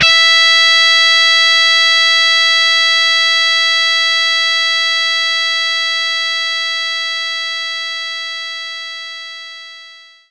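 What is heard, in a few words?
Distortion Guitar E2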